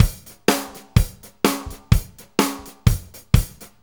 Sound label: Big Jim, Drum Tools, Loops; Sonic Foundry (Sony Creative Software); Loops & Samples Drum Tools